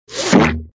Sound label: Snack Entry; scoobysnackmachine